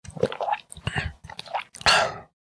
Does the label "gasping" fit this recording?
Yes